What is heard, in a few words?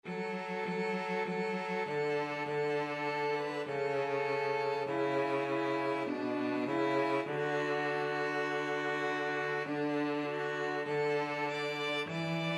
violin cello, violin cello duet; onward christian